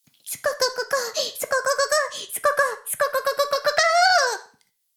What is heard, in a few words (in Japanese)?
シコシコ音声; 古き城のドラゴニート